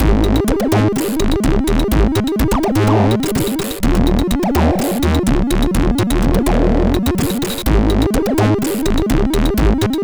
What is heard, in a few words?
Notetune, sid MOS6581R3